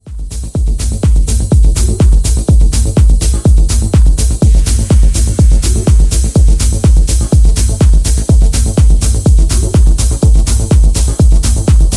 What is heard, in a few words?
Deep Kick; Numinous; Batusim